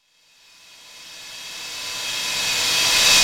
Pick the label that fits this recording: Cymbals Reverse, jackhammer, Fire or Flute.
Cymbals Reverse